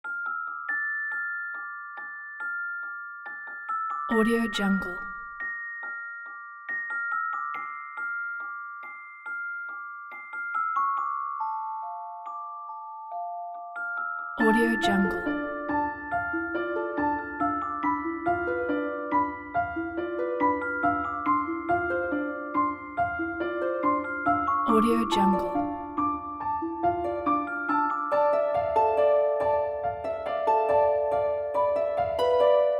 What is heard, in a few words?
holiday joy magical ambient celesta